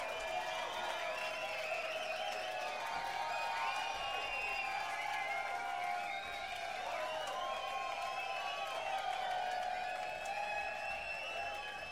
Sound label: Crowd; Boulder, CO; Fox Theatre